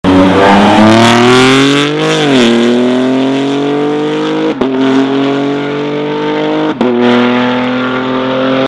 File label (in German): Fahrsound 800PS